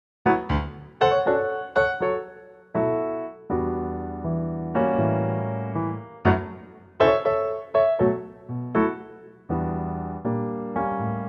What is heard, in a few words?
D Piano